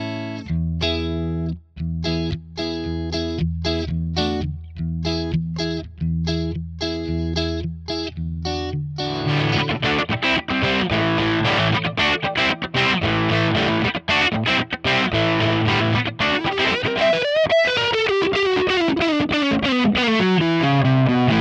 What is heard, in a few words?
strat